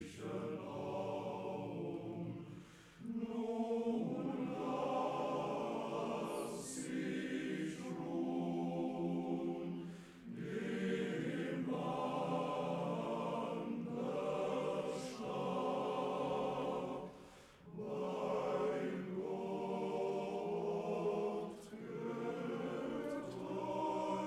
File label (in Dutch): Koor mannen